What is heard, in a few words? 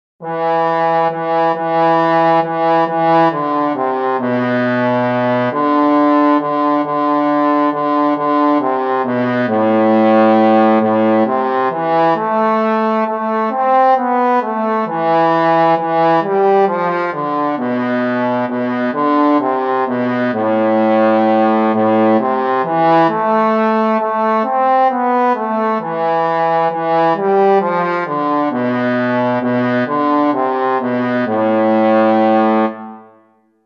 tumbalalaika trombone